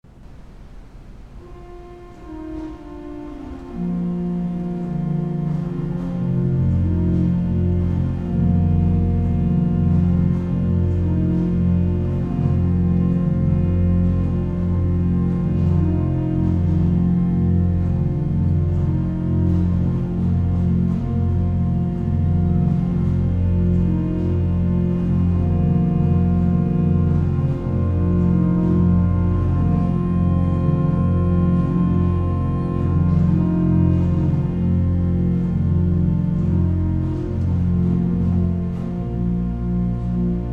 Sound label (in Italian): pastorale per organo